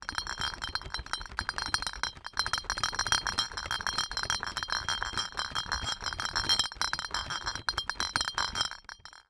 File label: Household Bottle Six Pack Rattle Long